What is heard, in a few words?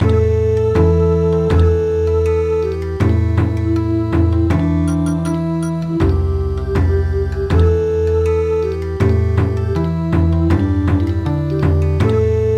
Bon Odori, midi; Hame Hame Hame